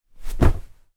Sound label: household pillow throw down on bed wooden slats slight noise